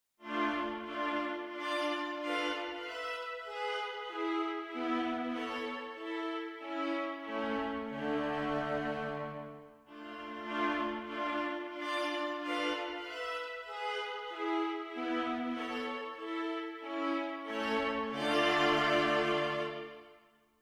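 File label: String Quartet Loop Theme B; Factory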